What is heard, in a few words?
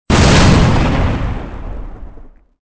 explosion short; weapons; Soldier Of Fortune II Double Helix; XBOX